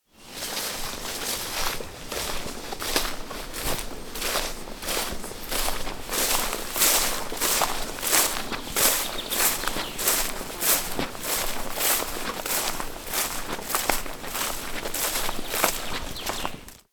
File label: Steps On A Forest Grass